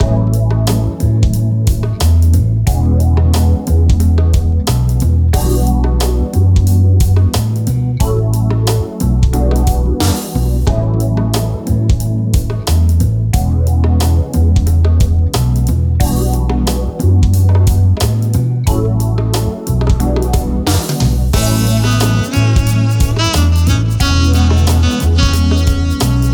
smooth jazzy bar mix

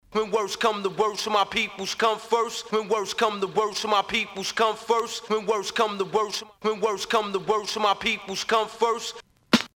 scratch kit

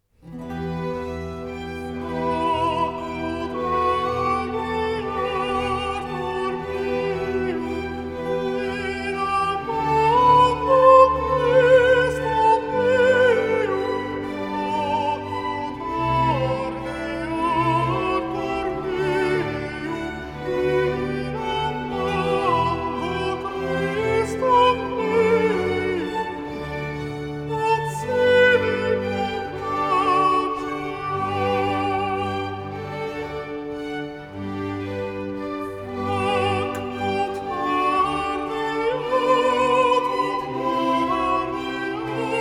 stabat mater for alto solo

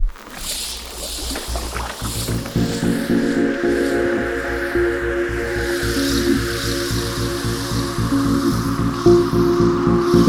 beach ambiance lounge chillout megamix

